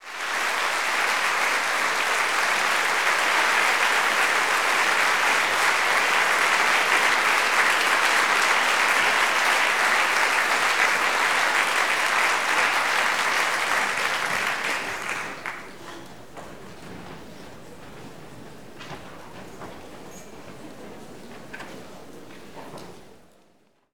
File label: applause laughs; best service Blue Box